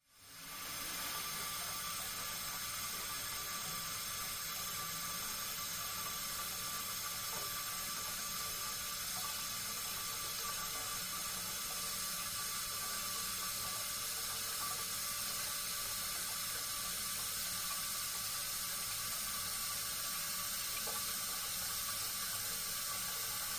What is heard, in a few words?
Water Pipe Home; pipes